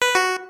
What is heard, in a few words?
failure